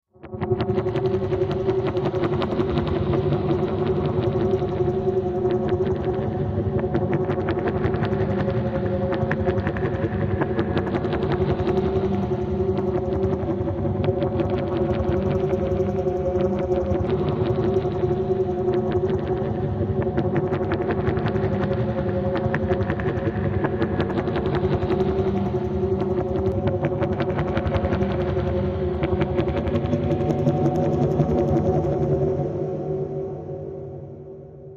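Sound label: Wandering Pulsing Energy, probes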